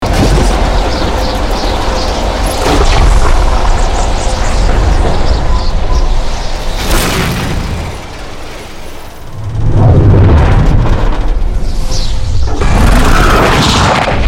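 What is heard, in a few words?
Ambience Ship Phase Linear; Magpie Ship Phase 05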